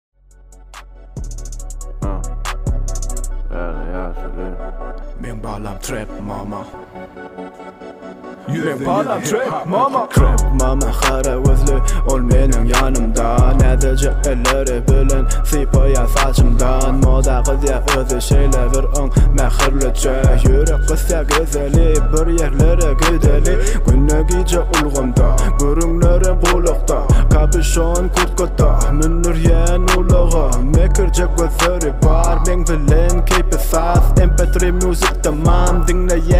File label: Trap